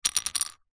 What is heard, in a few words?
leisure poker dealer button chip spin hard surface; zapsplat